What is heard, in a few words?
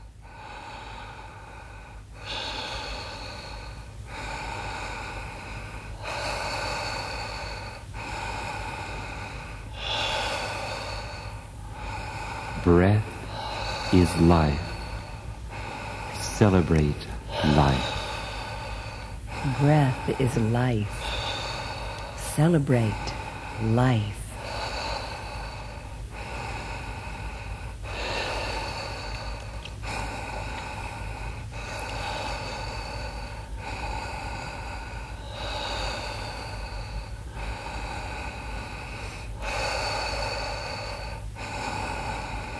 breath